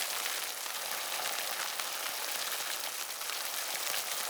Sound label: barbecue sizzle